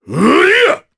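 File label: Vox Attack, Japanese; voices; heroes; Ricardo; kingsraid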